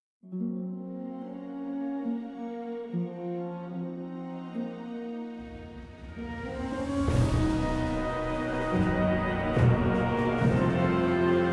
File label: event neutral; opal